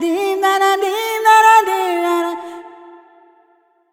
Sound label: deem high, vocals female; Sonic Foundry (Sony Creative Software); World Pop